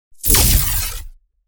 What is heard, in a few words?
Powerful energy blast shockwave